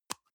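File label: deodorant can lid open soft; haus-garten; badezimmer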